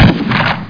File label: shoot; CHASM